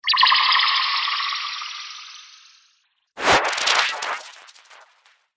Magic Spells; Magic Sound Effects

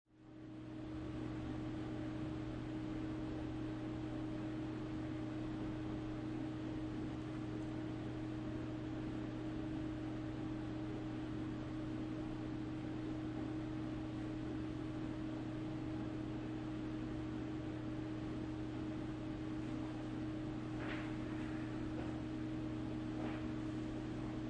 Restroom

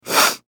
Human Female Sniff; Sick (Sound Effects:Human)

